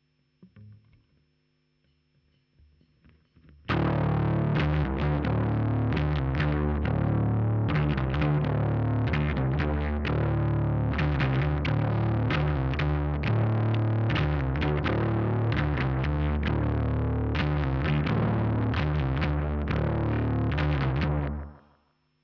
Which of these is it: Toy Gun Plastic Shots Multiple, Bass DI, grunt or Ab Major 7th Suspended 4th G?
Bass DI